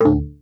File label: Fail; Dunkanoid